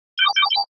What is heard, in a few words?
computer bleep; NCH Library